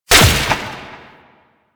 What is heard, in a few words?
Orb Destroy; Warframe; Duviri; Conservation